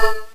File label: BUZZ; MPG-Net (USA)